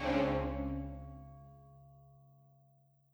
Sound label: death, rpg game tracks; 25 Rpg Game Tracks